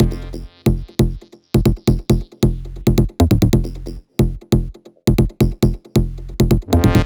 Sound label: Special Sounds Root F 136 BPM; vengeance essential club sounds-3